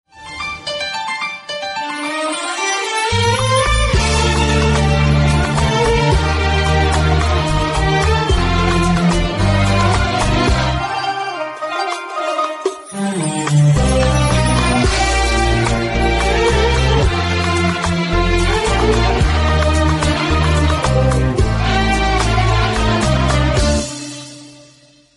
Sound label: arabic music